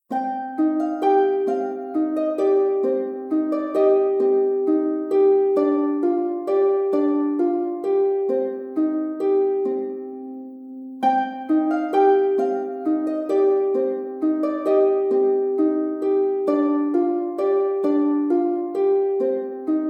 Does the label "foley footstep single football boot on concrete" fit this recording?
No